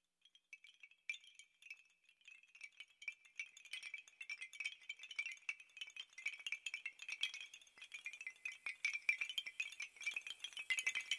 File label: Sound Meditation, Bowls, Bamboo Wind Chimes, Rainstick, Tingsha; Singing Bowls; Spiritual